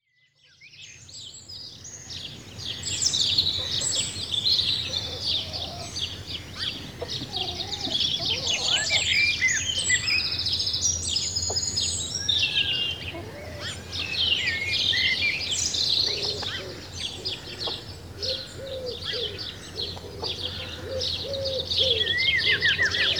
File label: Farmstead Summer, Early Morning; Rural Soundscapes; BBC Sound Effects Library